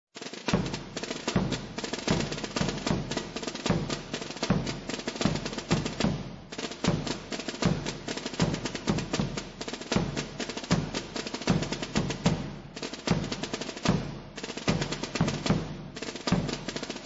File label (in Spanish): imagenes, tambores; Hijar